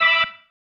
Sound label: Stab One Shots, Organ